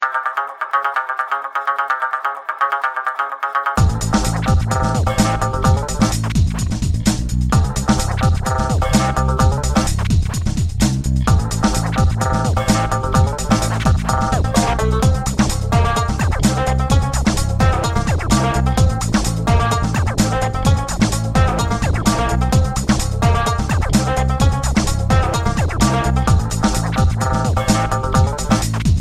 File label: belly dance ringtone